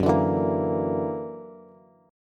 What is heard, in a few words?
Gb Augmented 7th Gb down Bass; Gb+7 strummed